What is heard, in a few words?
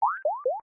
denied